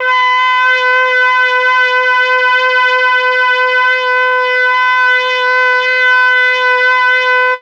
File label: MEL BRASS; ILIO; Propeller Island; Legendary M400